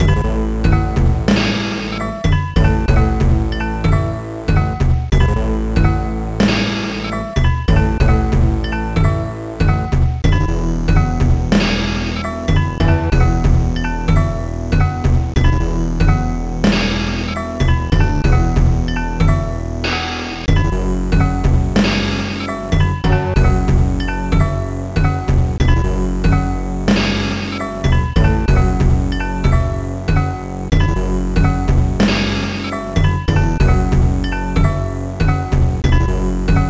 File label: amiga in game; budokan